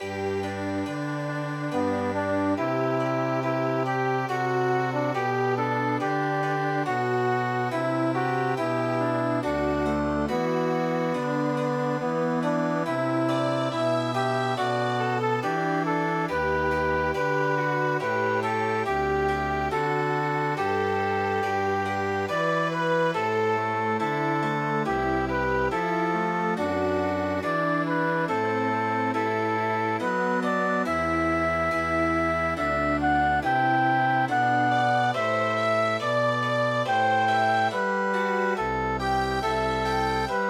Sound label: Chamber Music; Jupiter The Bringer Of Jollity